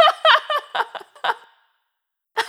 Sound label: laugh in time, vocal; Sonic Foundry (Sony Creative Software) - Pocket Diva World Class Vocal Samples; Words